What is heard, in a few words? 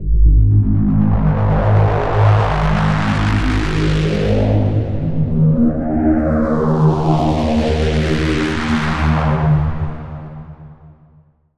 SFX - Reactor; Routed Sound; Vital Expansion - Doom Carpenter Vol.1